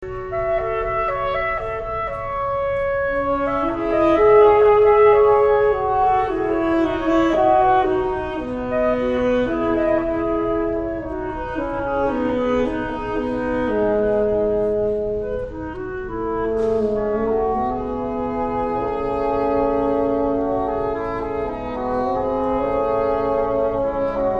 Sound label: Sax Solo